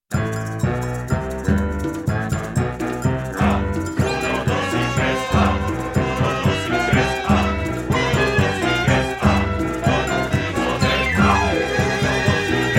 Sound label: Conga